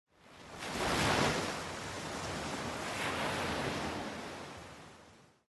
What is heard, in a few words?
Wave Fur